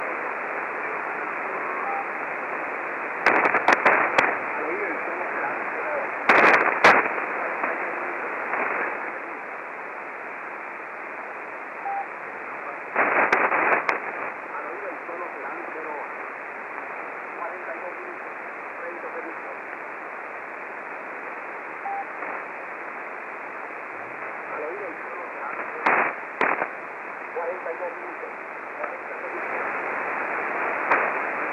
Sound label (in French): 3810khz, vlf signaux horaires